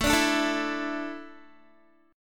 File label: A Suspended 4th, Guitar; Asus4; strummed